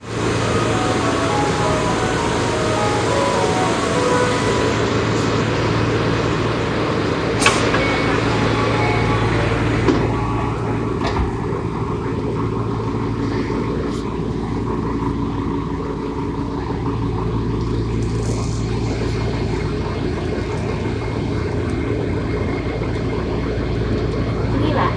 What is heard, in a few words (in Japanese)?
都営大江戸線 六本木→麻布十番